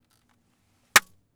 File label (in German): Auto